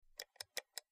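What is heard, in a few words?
Low pitched Mouse Single, mouse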